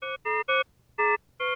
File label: Vent Caution, alarms